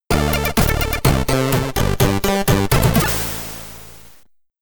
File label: Get Ready! (SID Stereo); quadrant commodore 64